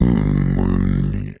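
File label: dragon ambient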